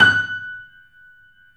F#, 3D Pianos, Boesendorfer; E-MU Producer Series Vol. 5; 3-D Audio Collection

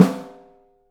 OHSTSN L, 3D Drums

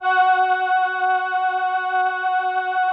VP-330, String Machines

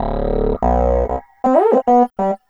Slap Wah, Bass; Sonic Foundry (Sony Creative Software); Synchro Funk

